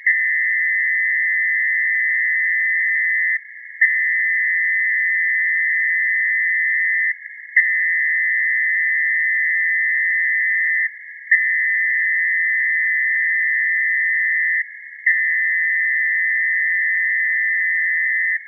pactor

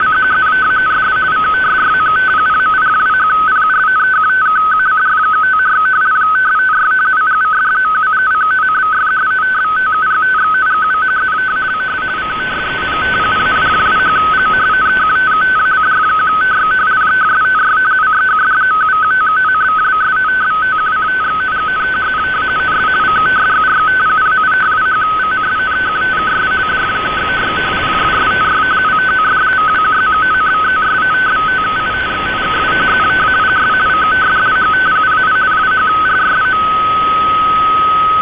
fsk 250 Hz 50 bps; f15638khz

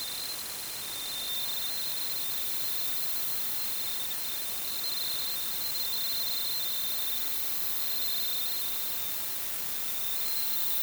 6970khz cf mil-188-110c appd bw 21khz sr 1680

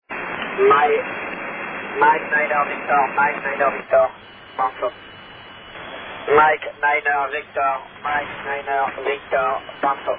bandsaw

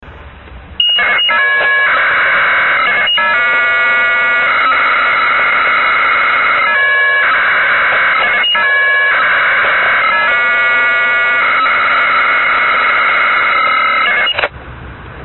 signal

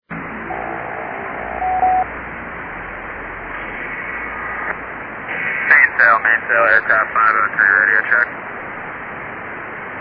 aircraft

